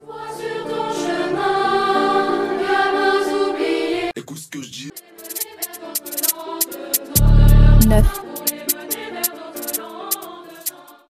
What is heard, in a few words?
DRILL